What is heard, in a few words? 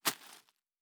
Leaf Walk Surface, Footsteps; Survival Game Sounds - Lite Edition